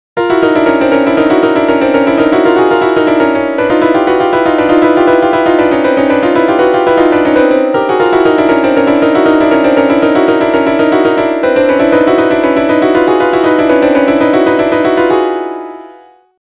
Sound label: piano app; im making a piano app